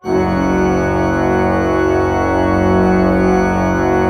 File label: F1, Cathedral Organ, PED VOL WERK; Propeller Island